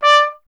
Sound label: TP D; Miroslav Vitous - Solo Instruments VOL-1; Trumpet